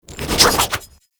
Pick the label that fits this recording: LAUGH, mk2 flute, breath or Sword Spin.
Sword Spin